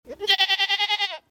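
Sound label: one-year-old mini dairy goat sings to get a snack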